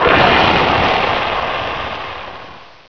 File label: TORPEDO